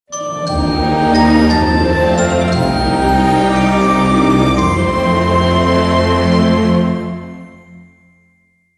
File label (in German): jingle ghost positiv; Haunted